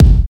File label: good kick single shot g sharp key, bass drum single hit tuned to the G# note